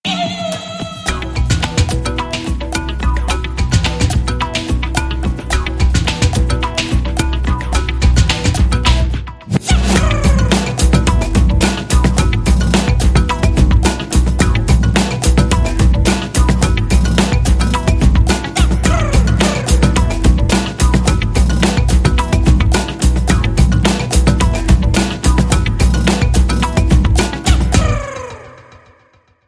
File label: Bass